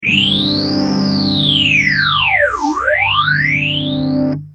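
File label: guitar boss ph3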